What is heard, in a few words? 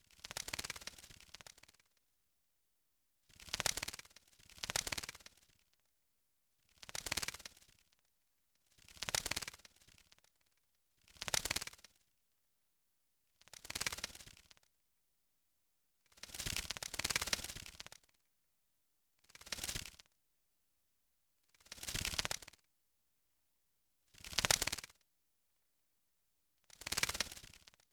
bat wing flaps single bat fly